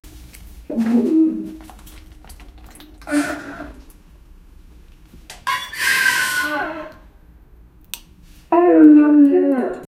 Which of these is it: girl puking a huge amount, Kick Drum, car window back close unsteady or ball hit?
girl puking a huge amount